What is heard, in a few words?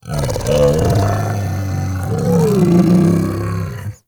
Infested Vocal Charge, Enemies; Warframe; Femur